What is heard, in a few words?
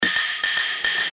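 barabanochki, little drums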